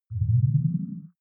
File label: game start deep, signale